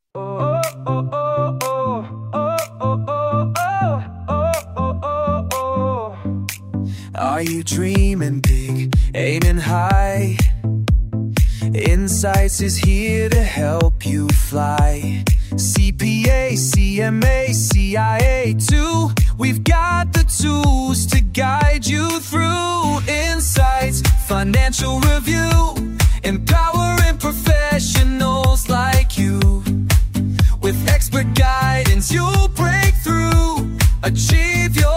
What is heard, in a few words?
music; Insights Financial Review